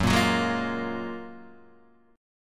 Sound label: Gb Major 9th, down, Guitar, DADGAD